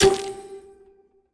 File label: match sequence sfx; Candy Crush Friends